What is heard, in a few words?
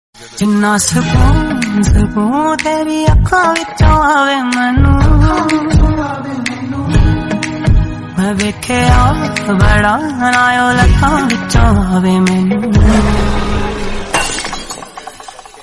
Hindi Song